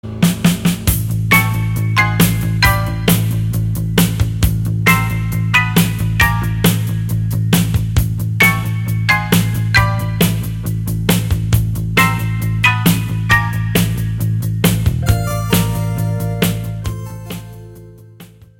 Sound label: xylophone